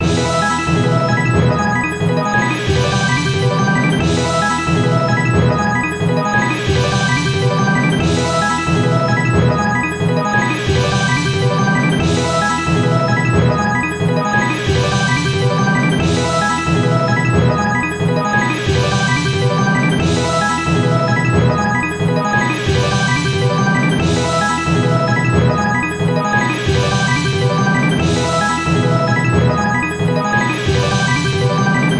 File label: big Win Loop; jack and beanstalk